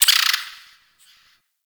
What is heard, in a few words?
Forest Distant Medium Creature Tree Small Call; Warframe; Grineer Forest